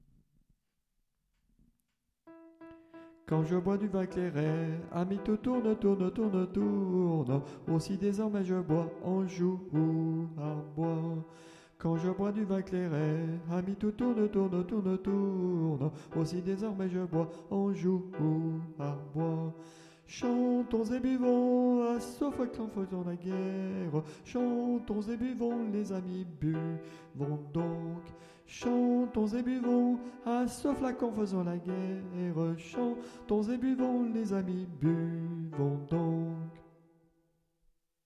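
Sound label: soprano; le vin clairet (soprano)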